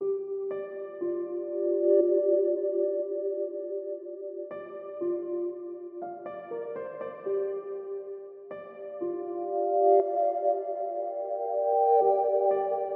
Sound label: anime type beat